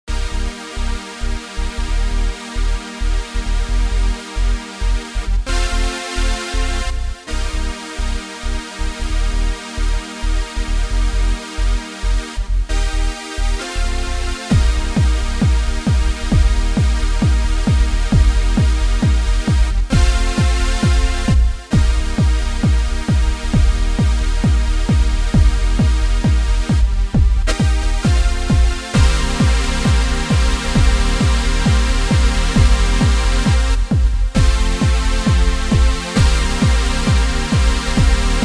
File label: JX Pad 303 Groove